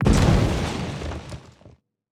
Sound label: damage hits explosions, Warships